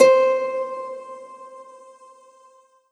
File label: Yamaha MU90R Laser Harp C5